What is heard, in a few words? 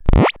Move; RetroGamesSoundFX